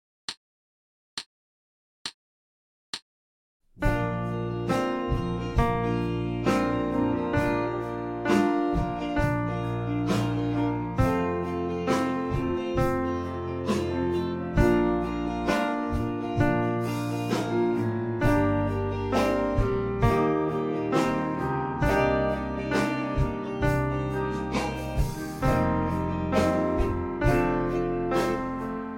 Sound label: 68 bpm